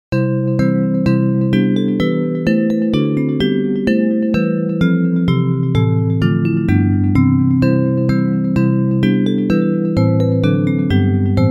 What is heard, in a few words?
Bells